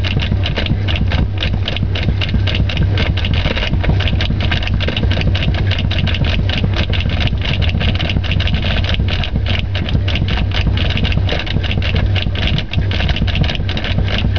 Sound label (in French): lave-vaisselle